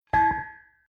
Mechanical Large Metal Pipe Lightly Dropped On Ground Impact Bounces